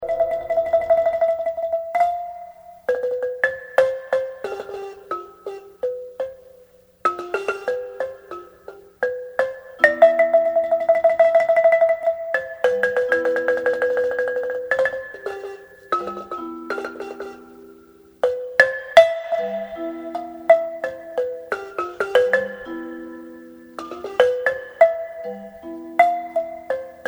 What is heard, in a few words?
Balafon